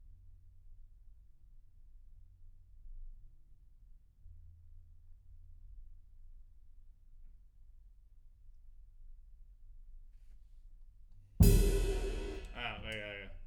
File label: Room A